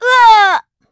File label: Vox Damage; kingsraid; en